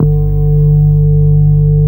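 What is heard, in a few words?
CX3 organ G1 L, Keyboards Old School; USB Soundscan vol.09 - Keyboards Old School; AKAI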